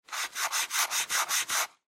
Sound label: Eraser Rub